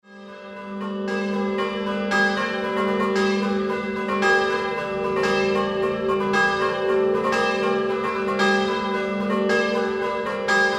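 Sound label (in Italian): Carnia scampanottata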